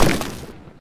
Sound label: Clay Hit; Risk of Rain